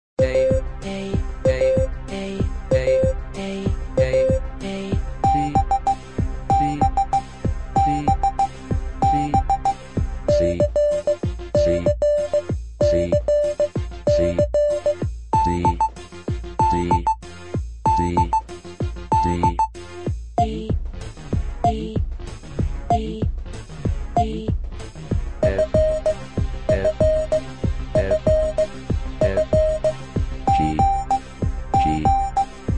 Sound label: Morse Code Song; telegrafia